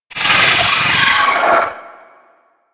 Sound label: Amalgam Moa Satyr Vocal Death; Warframe